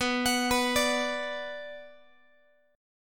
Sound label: B Minor B Guitar; arpeggiated; JGuitar